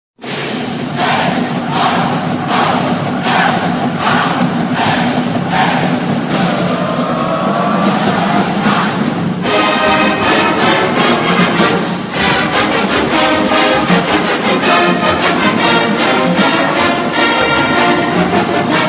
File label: carolina fight song; south carolina